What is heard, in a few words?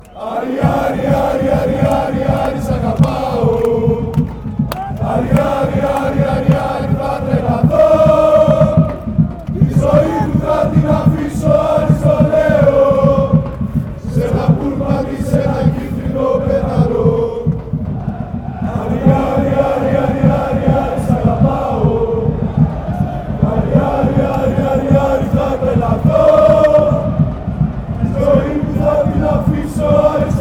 chant